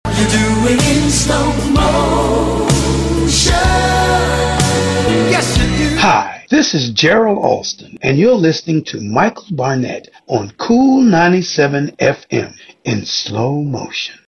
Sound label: JINGLE